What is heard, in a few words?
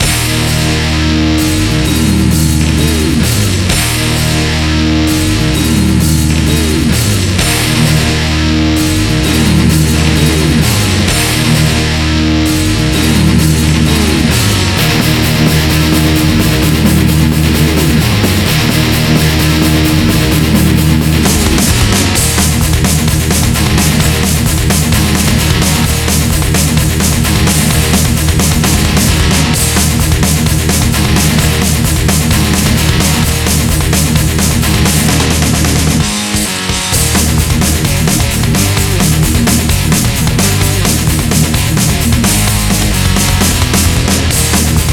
Heavy Riff Looped; Dual Blade Battle of the Female Ninja